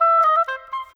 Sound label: Oboe, Orchestral; Sonic Foundry (Sony Creative Software); Orchestral VOL-2; Modern 27